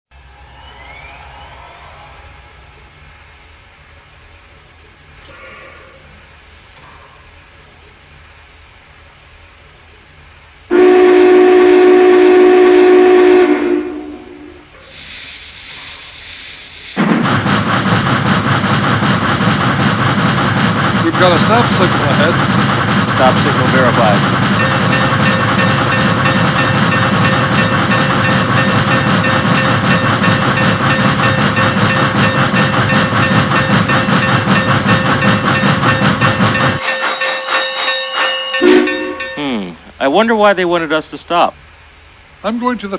Bigboy COAL, electric trains

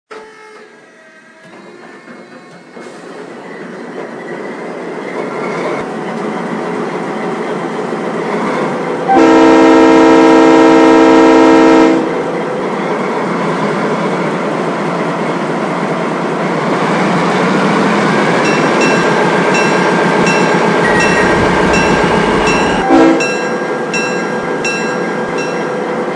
GE Dash-8

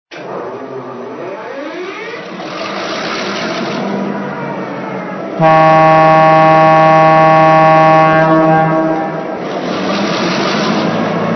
MP15DC

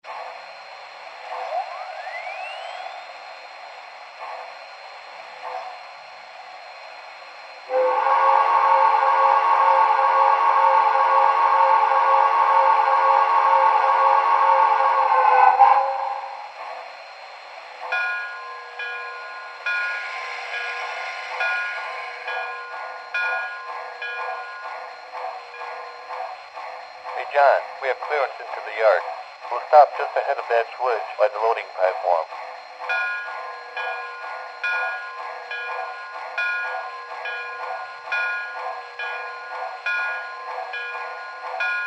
Mikado, electric trains; CnA

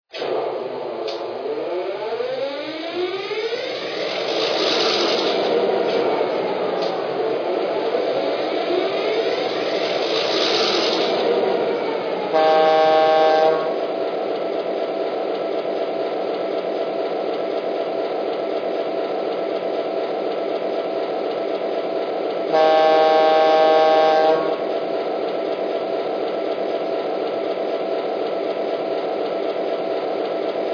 e6, electric trains

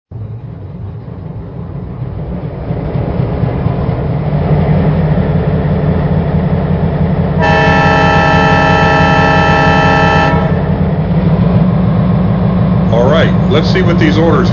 EMD SW-8, electric trains

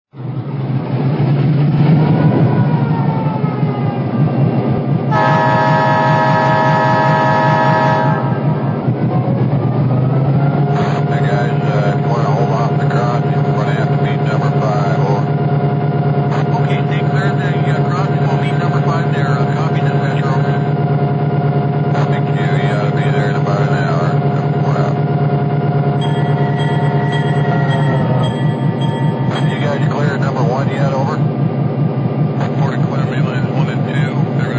SD24

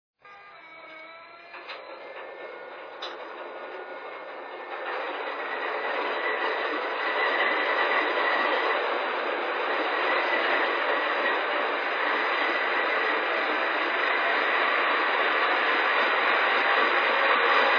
ES44AC gen

